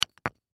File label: Toy Wood Block, Foley Toy Wood Building Block Stack Click; Miscellaneous Toy; Blastwave